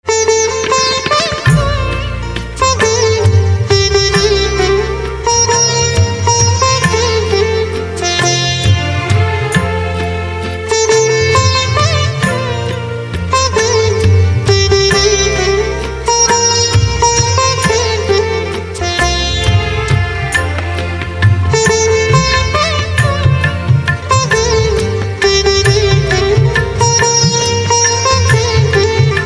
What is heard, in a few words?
Veena, instrumental ringtones